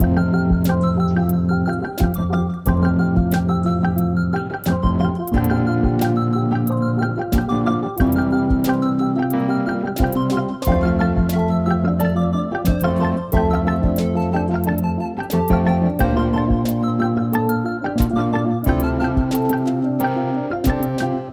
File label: Speedy Loop 180bpm; Opseck; jenny leclue detectiv